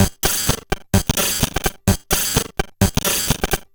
Bent TR505 (128BPM), Circuit Bent

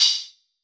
Soft Bright Crystal, Hats; Airwindows

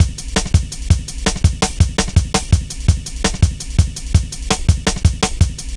Drum Loops, Jungle

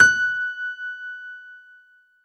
F#5 DANCE, ANALOG SEQ, Roland JV 2080

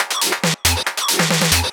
drum 138, set-of-signals, drum-loops, Techno; Techno ID

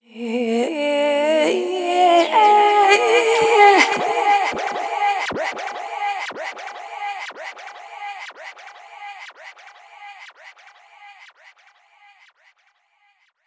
Sahara Static, Vocal Samples, Sound Design; Sonic Foundry (Sony Creative Software); Pocket Diva World Class Vocal Samples

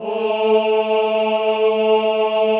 CHO A2, CHO Ooh; Sound & Vision; Gigapack I CD 2

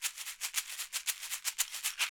Caxixi Beats; Roland LCDP14 Africa VOL-2

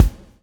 SWING

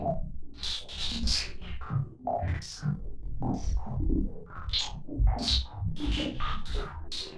Moving Noise 130, Tempo Loops 130bpm; stereo toolkit samples

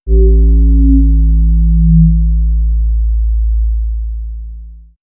Swirls Ambient Drone, pads; X-Static Goldmine

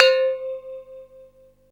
BELL NO ECHO; DRUMS & PERC

